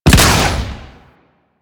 Chem Strike Nox Rifle Shot 3D

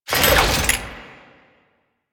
Body Roll; Foley

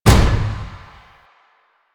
Service Door Close; ambience; Con Junction; Warframe; Entrati

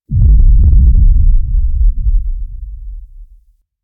Undercroft Sub Sweetener, Ambience; Warframe; Duviri

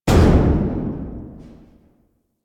Railjack Weapon One Hull Mech; Modular Weapons

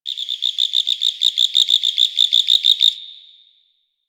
Drifter Camp Valley Tree Critter; Warframe; Quests; The New War

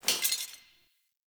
scrap metal pickup; Objects; Pickups

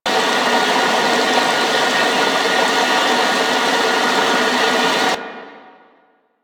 Ocean Water Tube Small Loop; Ambience; Props; Warframe; Grineer Ocean

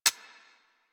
Decoy Counter Measure Mech

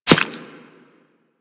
Arrow Impact Flesh; Warframe; Weapons

